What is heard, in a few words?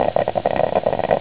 cofee pot